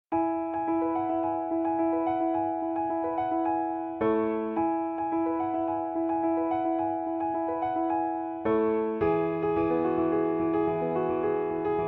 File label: Piano